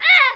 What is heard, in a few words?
pain; Alien Arena